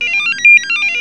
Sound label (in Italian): squillo